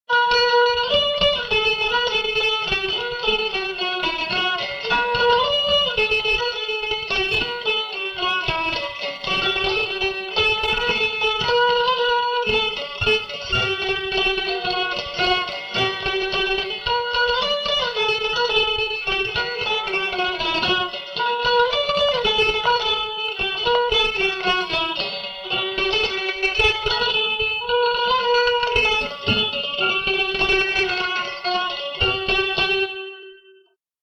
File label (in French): polka duo, epinette